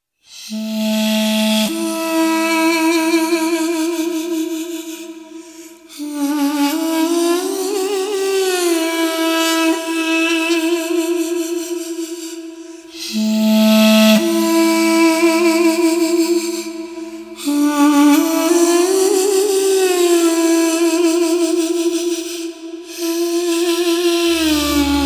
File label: Duduk